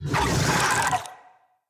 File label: Infested Big Ashen Vocal Attack; Enemies